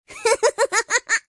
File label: funny laughing baby